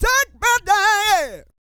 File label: SING; Spectrasonics; Vocal Planet Vol.1 Gospel; akai